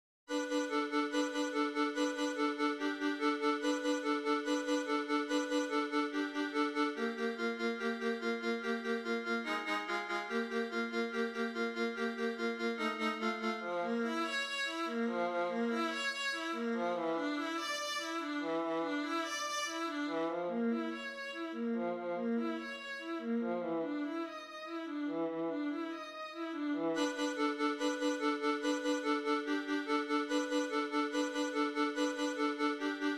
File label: Viola